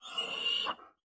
熊猫担忧声

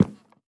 樱花木 踩踏声

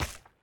树脂放置声

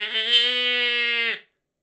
山羊尖叫声

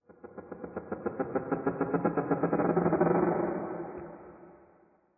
绯红森林拨动声; ambient

